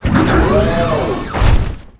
devastator walk; Emperor - Battle for Dune

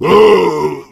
male boomer spot prey, voice, attack; Left 4 Dead 2

